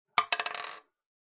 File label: dice roll